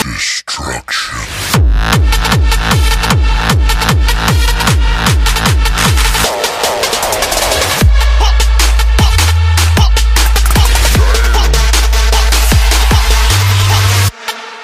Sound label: electro; roundsound